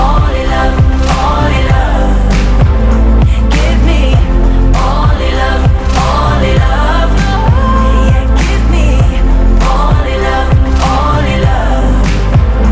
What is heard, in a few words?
Only Love